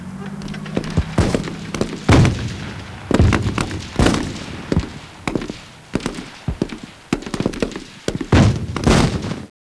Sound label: battle mix left; ambience